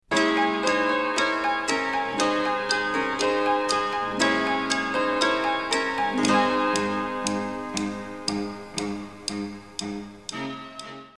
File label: Piano & drum sticks